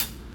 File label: drumbreak hihat; Hiphop